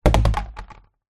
plastic washing basket tub down on concrete ground; foley